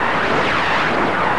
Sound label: TORNADO